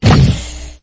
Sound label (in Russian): shum kosmicheskogo orudiya